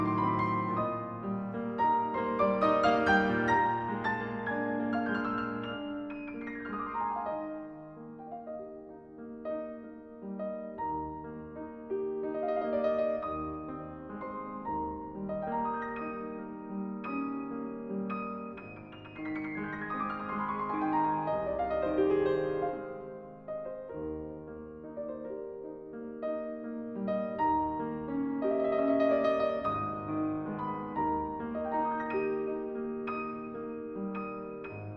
Piano Concerto in F Minor, Adagio, Symphony Orchestra; Howard Shelley; II. Adagio